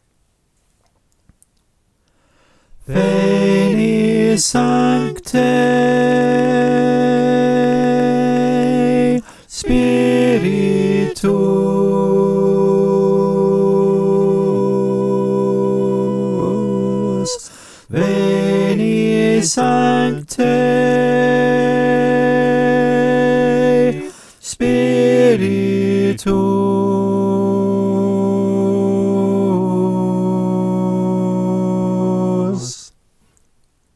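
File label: tenor, choir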